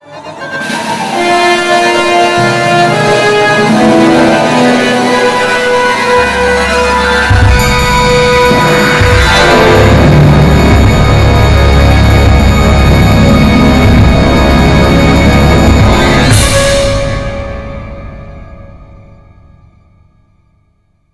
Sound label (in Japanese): Orch. 即興 ラフ案; コンプ; 即興演奏